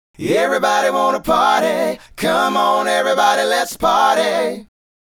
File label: Male Group, Hip-Hop and RnB Vocals